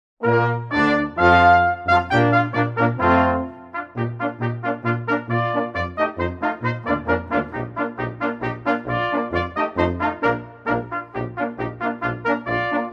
Dreher

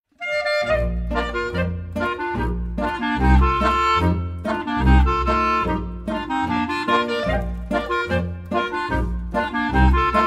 bairisch polka

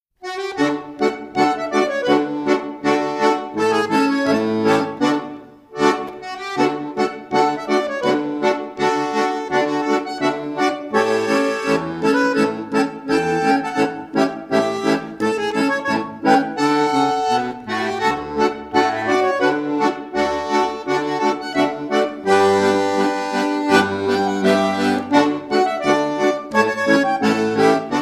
Rheinlaender

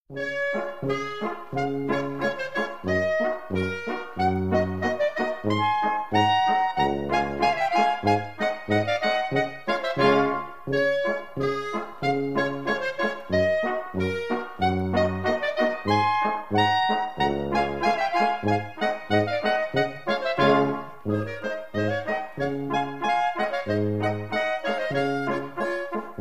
Polka

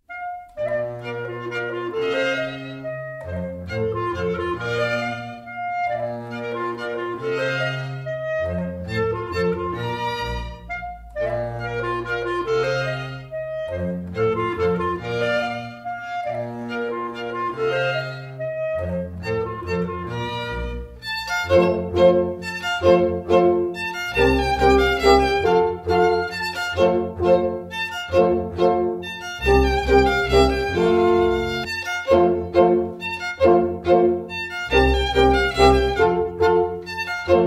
Laendlerische

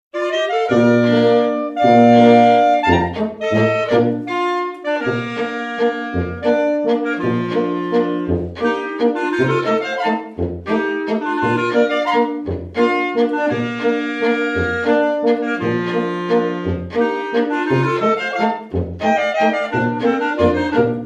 Halbwalzer; Die Kuah

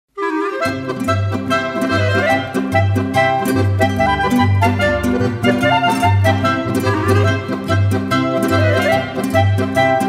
Musikanten Polka; Rupertiwinkler

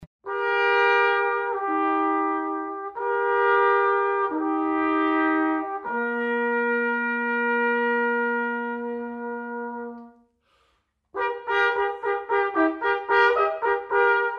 Stelzentanz Allegro

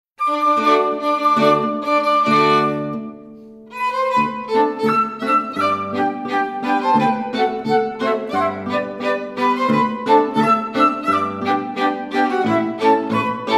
schottisch

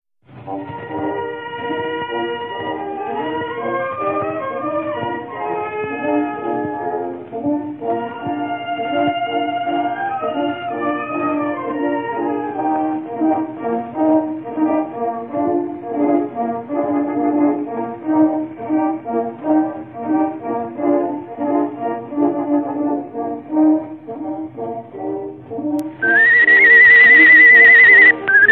Floetentoene

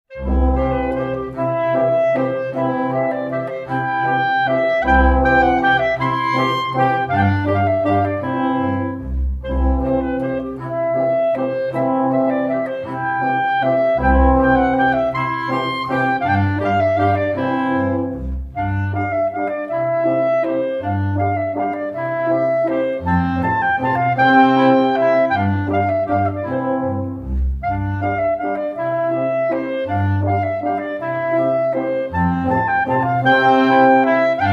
Allmando

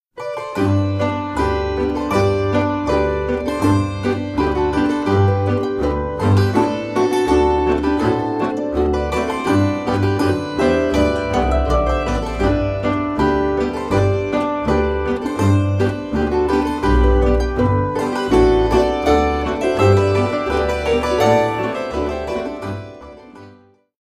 Rheinlaender

